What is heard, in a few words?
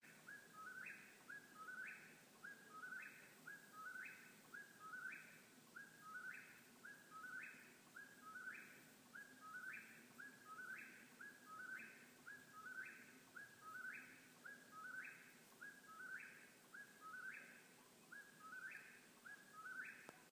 Whippoorwill